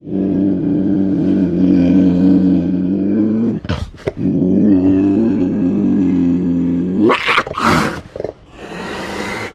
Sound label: Puma Cougar Gag Long G, big cats cougars